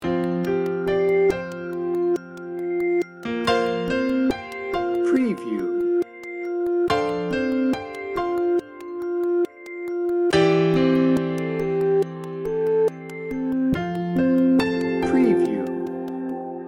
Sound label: hopeful and dreamy sting bumper